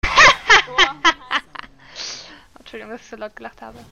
loud laugh; nidalee

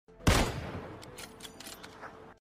kar98k sound effect; pubg mobile

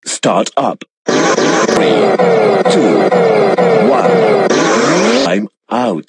accel sound; kamen rider faiz

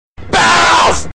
barrels notification sound; pewdiepie

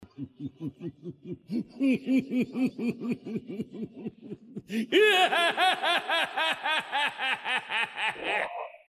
laugh; donquixiote doflamingo

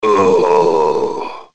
groan; undertaker

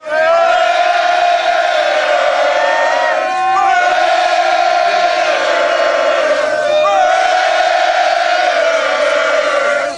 chant; raiders

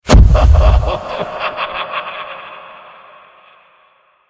ohohohohahahaha

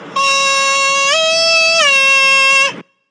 ahhhhhaaahhh

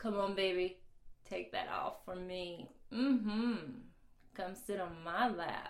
male burlesque; soundbible

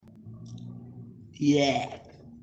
yehh

